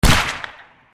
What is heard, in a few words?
shoot; kawitto banditto; kiwito bandito